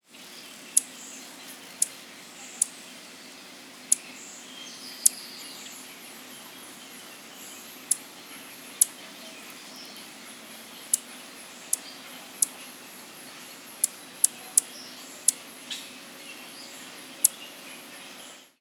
Orthorhyncus cristatus, chants des oiseaux; Guadeloupe

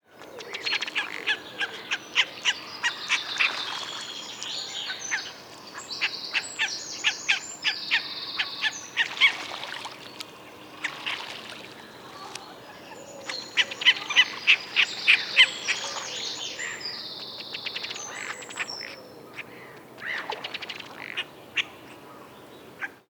Oxyura jamaicensis; Guadeloupe; chants des oiseaux

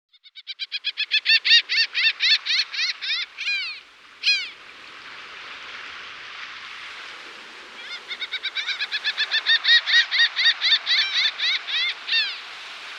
Mouette rieuse Laughing Gull, chants des oiseaux; Guadeloupe

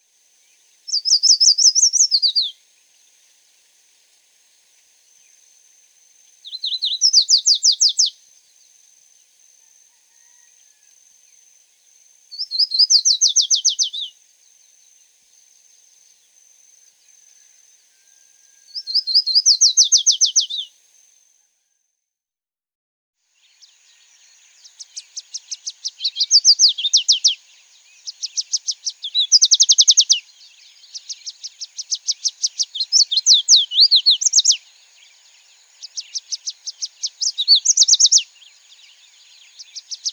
paruline jaune Yellow Warbler, chants des oiseaux; Guadeloupe

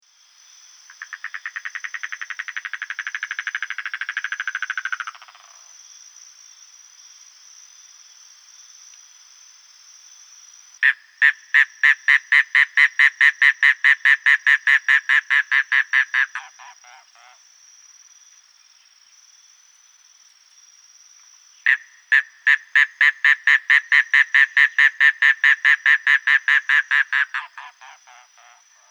Coulicou manioc Mangrove Cuckoo; Guadeloupe; chants des oiseaux

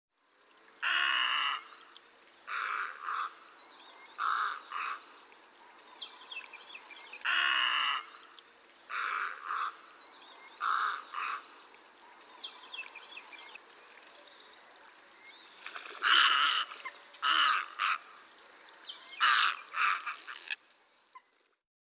Aigrette neigeuse Snowy Egret, chants des oiseaux; Guadeloupe

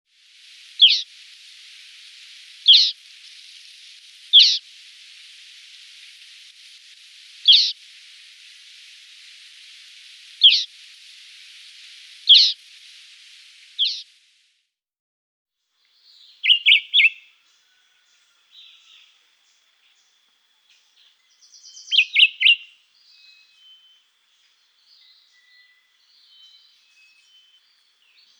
Vireo a moustache Black Whiskered Vireo; Guadeloupe; chants des oiseaux

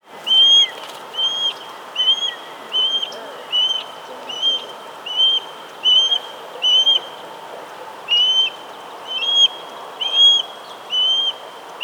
Pandion haliaetus flight call; Guadeloupe; chants des oiseaux

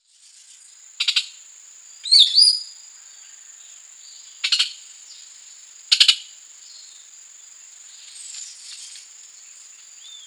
Quiscale merle Lesser Antillean Grackle; Guadeloupe; chants des oiseaux

